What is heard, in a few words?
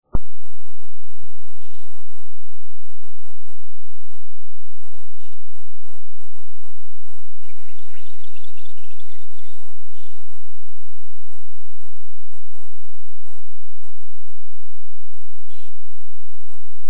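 zelenaya penochka golos, greenish warbler voice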